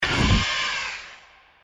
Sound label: pteranodon sfx